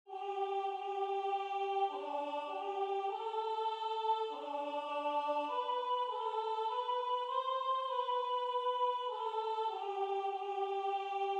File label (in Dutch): Sopraan; Adeste